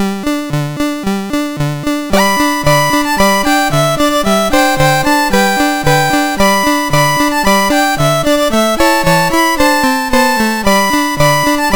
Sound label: Square Dance, MOS6581R2